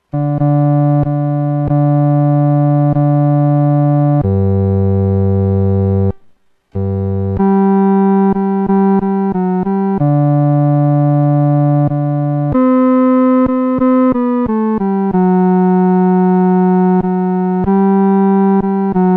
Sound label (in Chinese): 独奏 第四声